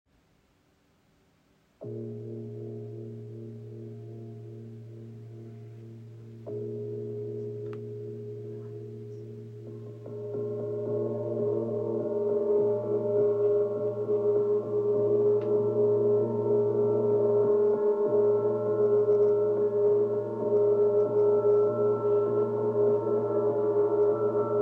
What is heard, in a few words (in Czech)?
Handgong; zvukove lazne